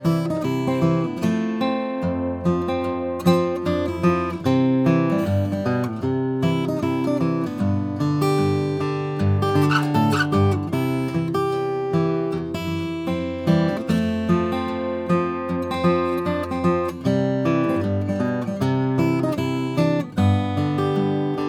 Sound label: gtr; sony pcmd50